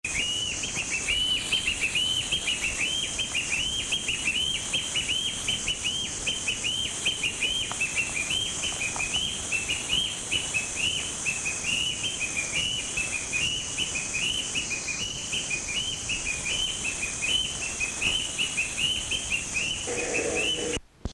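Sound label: white breasted mesite, Madagascar; Madagascar - 20th Oktober to 16th November 2003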